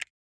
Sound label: button Hover Away; netent